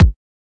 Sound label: kick; kik; drums single hits